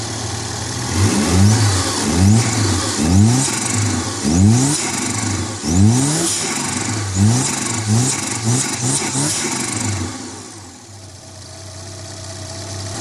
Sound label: Turbo, fiat